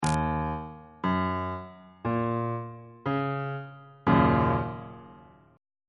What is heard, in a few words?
f sharp major 6th 3rd inversion